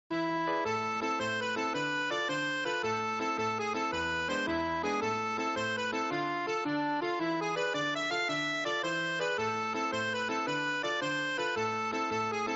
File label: buggy rock